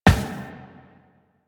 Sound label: Run Glass, Footsteps; Warframe; Tenno